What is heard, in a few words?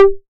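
808 high conga, Tape Sat; Tape808; Goldbaby Tape808 & Tape909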